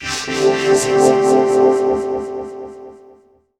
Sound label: vox, Vocal FX; neuro; Sinistarr